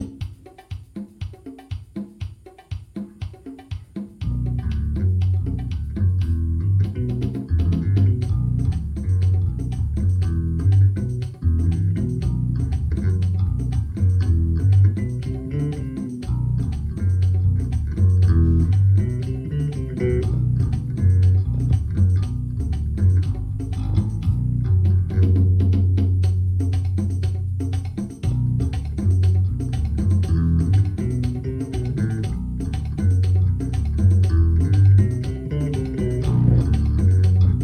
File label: Merengue